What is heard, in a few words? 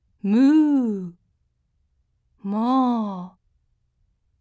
moo